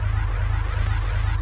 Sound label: SHIELD; RuneWar